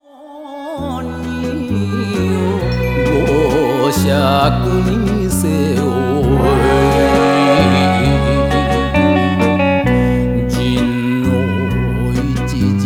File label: man enka